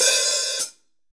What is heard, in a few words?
HH, Drums, HI-HATS; Best Service; Real Mega Drums VOL-1